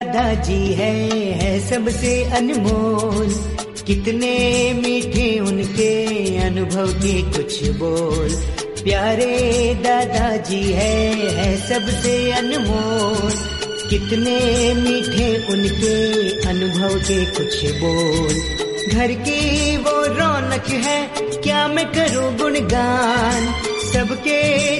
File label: Romantic Hindi